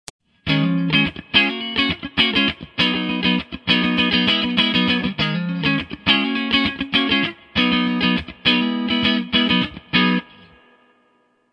RG75 Clean Verb

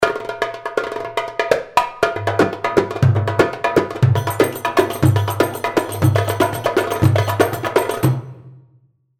Malfuf

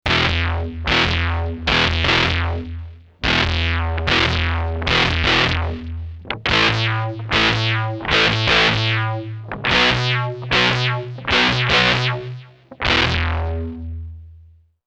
Crunch

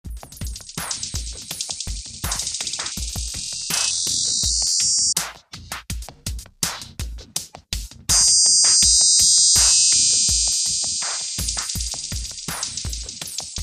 squirt weasel effect

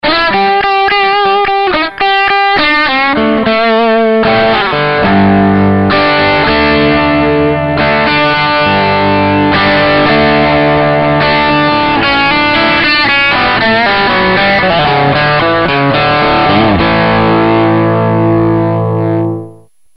Budda Twinmaster

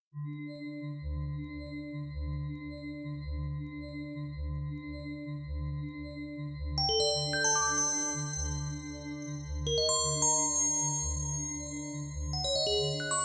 Potters Bells

